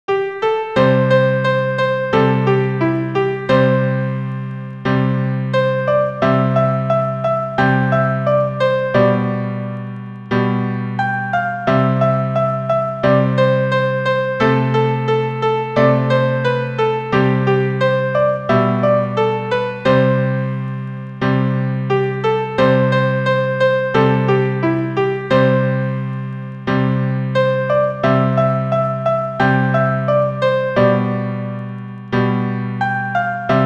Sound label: She'll Be Comin' Round The Mountain, with simple chords, 88 bpm